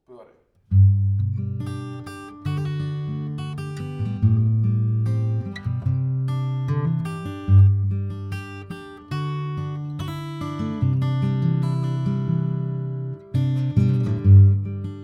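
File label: guitar soft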